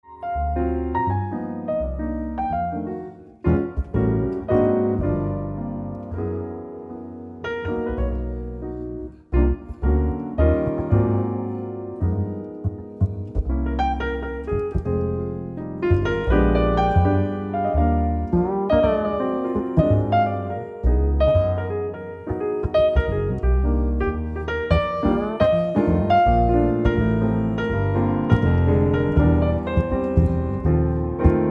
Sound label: ewi piano duet